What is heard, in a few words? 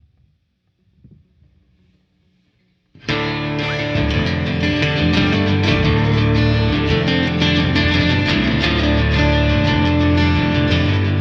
double drop d blues, Guitar backings